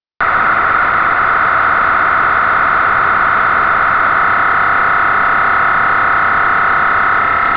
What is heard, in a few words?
dmr pmr